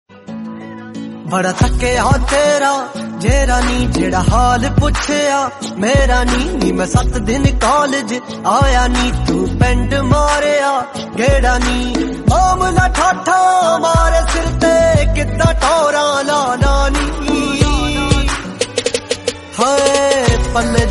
punjabi song

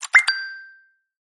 message sound notification tone

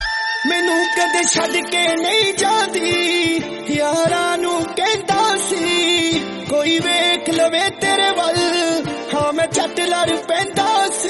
punjabi

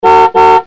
car horn sound

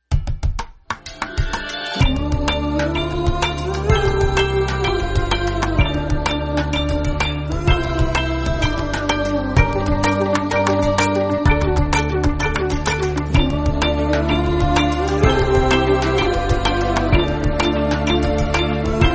bgm english

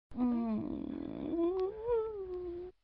moaning sound notification

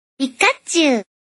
pikachu notification

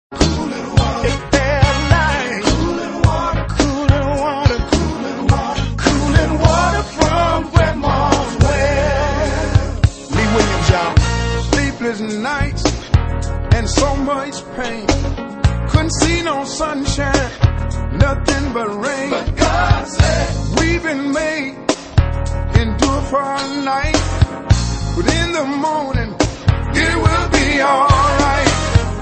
music

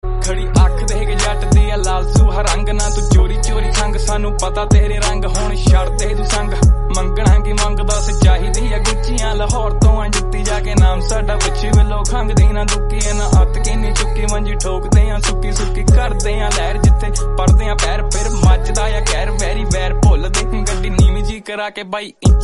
karaoke punjabi

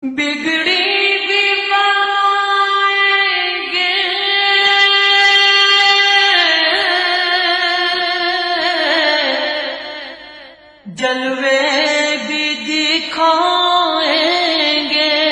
qawwali, islamic

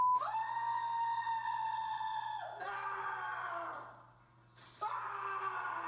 screams; colby brock

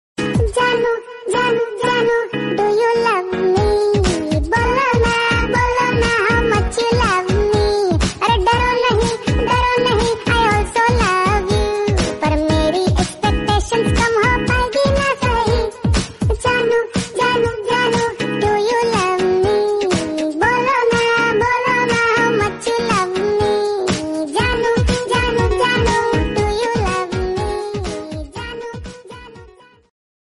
funny hindi song

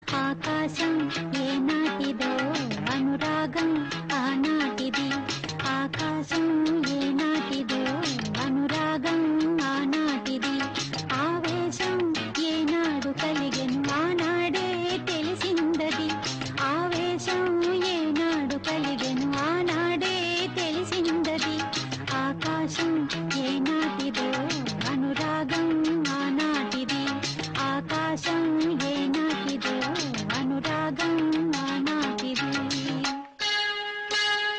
telugu song; nireekshana

